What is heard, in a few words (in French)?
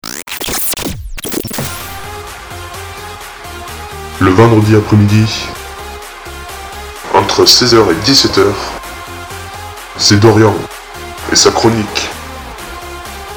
jingle radio